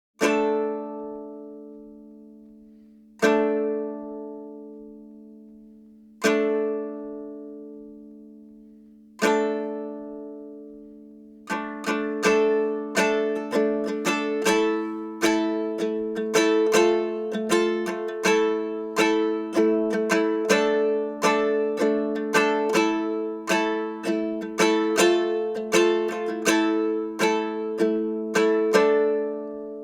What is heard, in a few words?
Tempo 80.0 bard kitara; assassin s creed valhalla